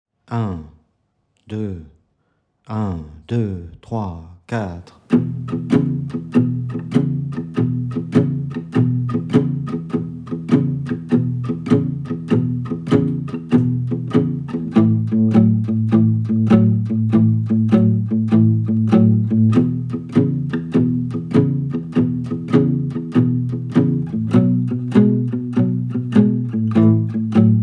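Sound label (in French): 100bpm ternaire, guitare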